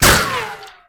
Gun Nail Rifle Shot; Weapons

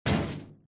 grate; firefight

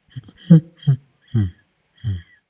Monkey Lose; Pitch Black Audio Pong

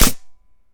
Gun Paintball Shot; SFX Kit; Weapons

